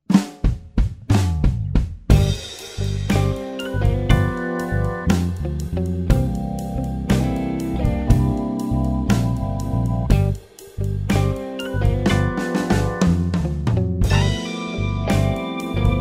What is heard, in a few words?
Blues; filmmusik